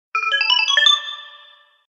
pozitivnoe sms veselaya smska

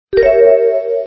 sms zvuk samyy prostoy i luchshiy sms

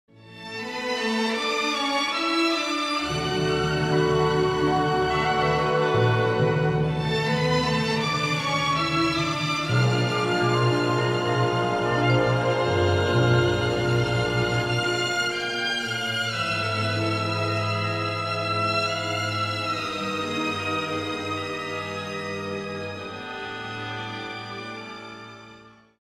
nezhnaya i vozdushnaya klassicheskaya melo

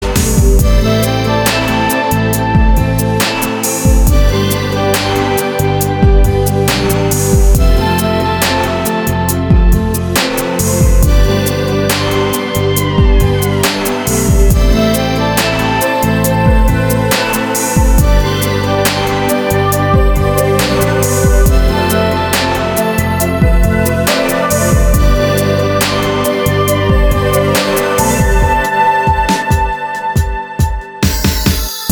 krasivaya muzyka zarubezhnaya instrumentalnaya muzyka

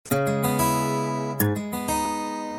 sms korotkiy zvuk na sms soobschenie

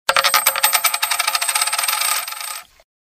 zvuk padayuschey monety 3d zvuk denezhnoy monety

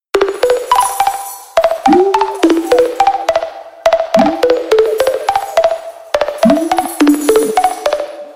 sms melodiya zabavnaya melodiya dlya sms

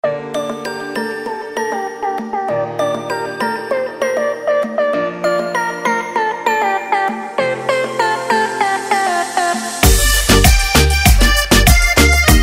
summer dance letnyaya s garmoshkoy

